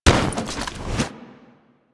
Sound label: Hit And Roll; Archwing To Roll; Warframe; Rail Jack; Archwing Deploy